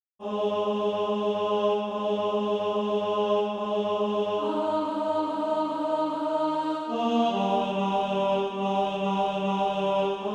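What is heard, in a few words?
ten, Practise Music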